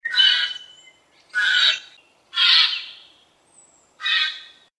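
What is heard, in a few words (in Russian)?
sojjka, jay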